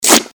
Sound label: metal into flesh